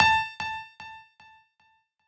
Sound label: piano sabi